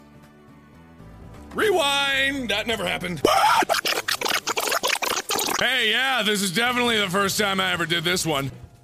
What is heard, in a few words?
rewind